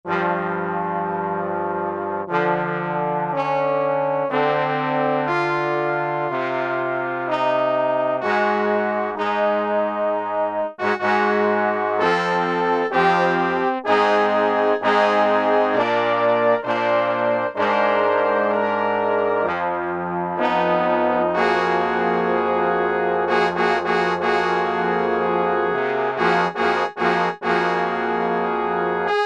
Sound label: Bone Sec., Brass And Woodwinds; Roland L-CDX-03